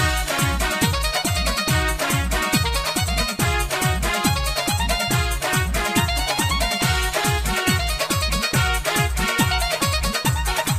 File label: instrumental music